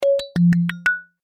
Cartoon Mallets Melody Short; Cartoon Music; Mallets Melody Random Short

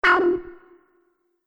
Multimedia Error, beep; Business Programs, Apps And Computing Noises

